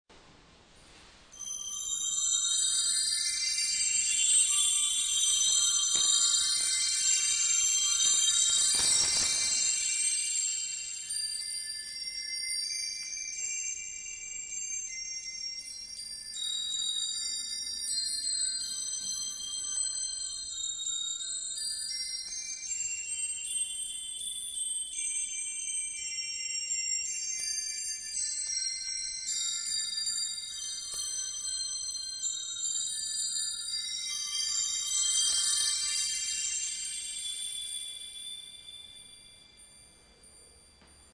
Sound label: Latin Percussion LP 626 LuBar Chimes